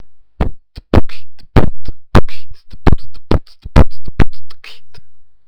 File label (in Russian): [b] [t] [b] [kch] [t] [b] [t] [b][kch][t][t] [b][t][t] x3 [b][t][t] [kch, битбокс; Быстрый бит; Надо делать его быстро